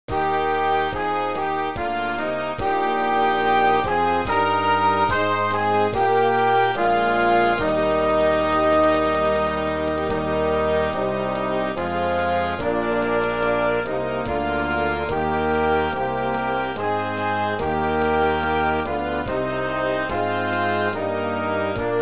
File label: God save the Queen Playback